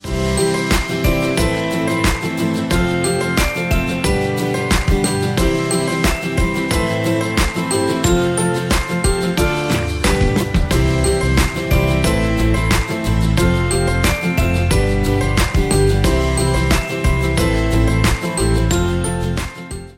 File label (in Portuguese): ANIMADO; pack de tilhas e efeitos 2.0